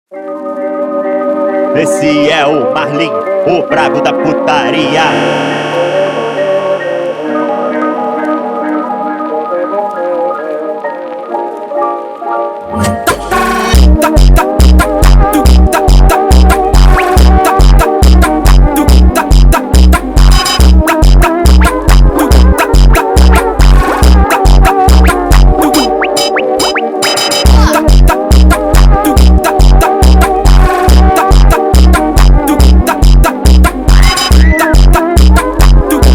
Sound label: funk brazilian phonk